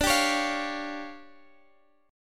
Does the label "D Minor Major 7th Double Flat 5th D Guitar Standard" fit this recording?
Yes